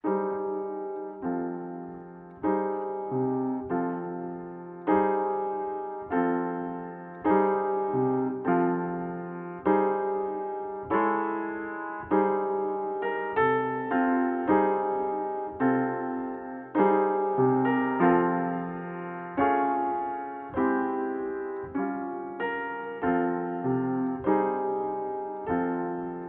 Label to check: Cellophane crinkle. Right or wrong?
Wrong